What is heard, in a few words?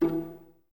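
Violas E 3 pizzicato, String Ensembles; Miroslav Vitous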